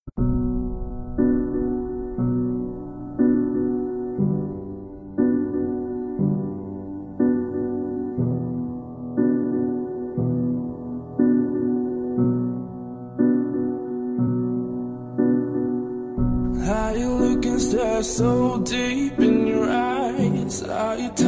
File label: male version